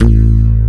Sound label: J8D BASS